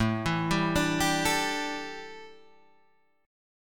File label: A 5th 11th, guitar chord; A511 arpeggiated